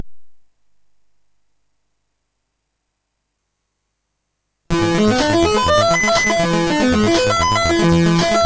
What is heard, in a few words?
Lead De-tuned, MOS6581R3